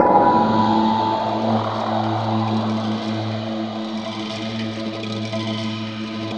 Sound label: gong, Gated FX; Optical Media International; Sonic Images Library; SI FX Vol 11